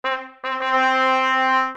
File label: Old vintage cartoon trumpet fanfare